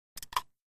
Fishing Reel Lock, fishing

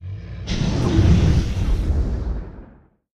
Dragon Roars Breaths

